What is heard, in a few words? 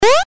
jump